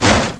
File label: CRUNCH; RedLine demo